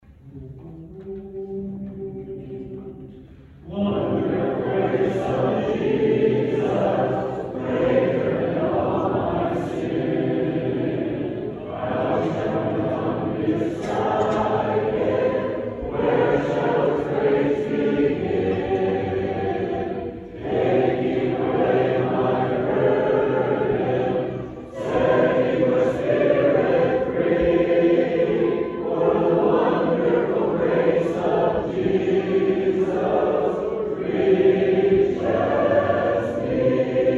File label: Hymn Sing